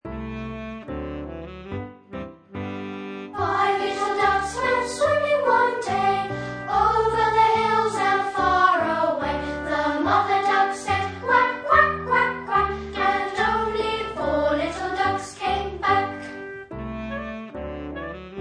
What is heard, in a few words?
five little ducks performance track